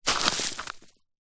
stones granite gravel and dust kick foot disperse roll; foley; zapsplat